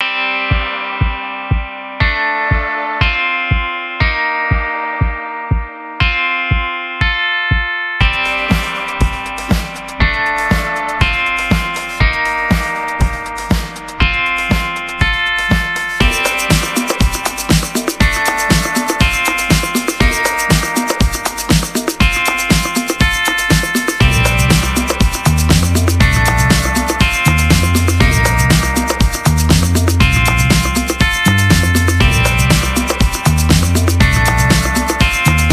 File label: Japan Disco Funk Mix